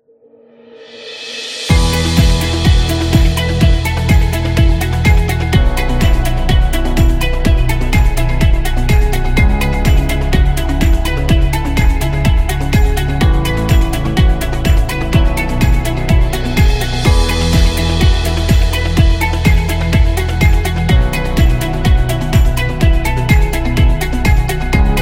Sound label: Promo Happy